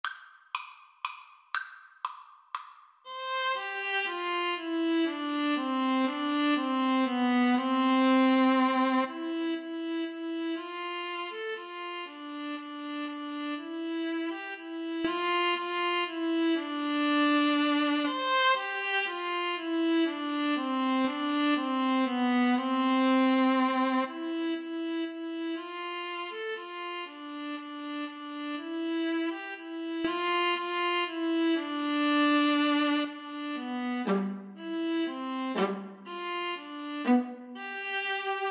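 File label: menuett violas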